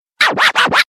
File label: Dj Scratch